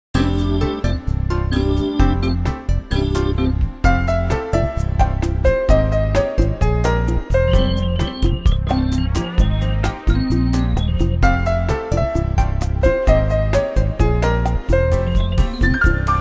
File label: Nokia Tones; Windows Phone apps